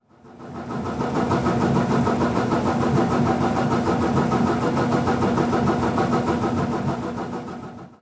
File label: clothes dryer